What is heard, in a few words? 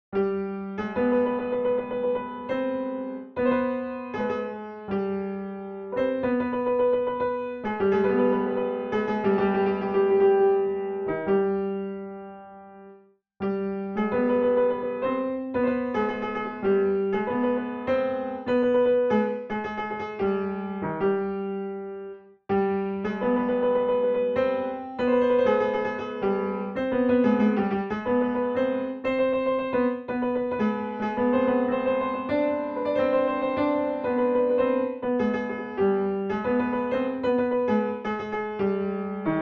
Chahargah, piano; Hodi-o Pahlavi; Radif Mahjoubi